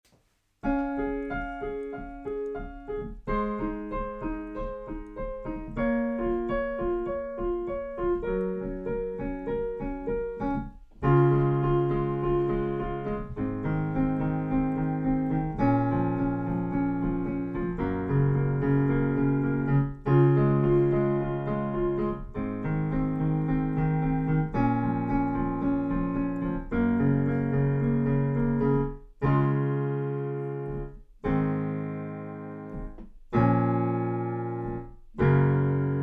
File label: piano test; Blue Yeti mikrofonu; Logitech